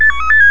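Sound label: push-to-talk, radio effect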